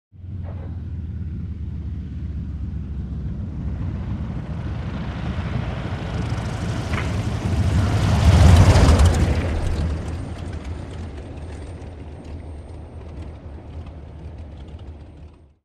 Impacts As Wheels Touch Down In Distance And During By, Whistle And Sputter As Plane Draws Down Runway. Medium To Close Perspective. Prop Plane.